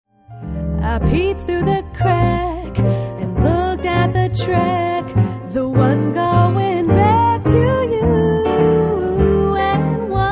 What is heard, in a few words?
Easy Swing; Vocal Samples